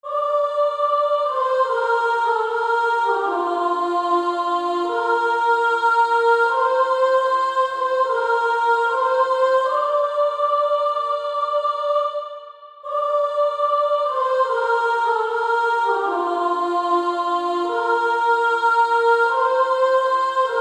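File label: Female Choir, soprano 2; Pass me Not O gentle Savior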